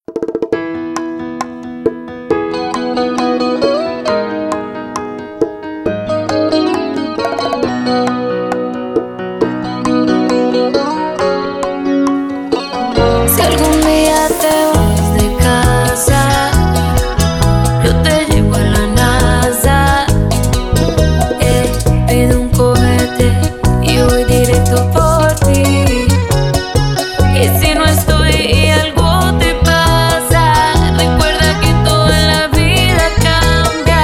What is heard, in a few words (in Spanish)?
versión bachata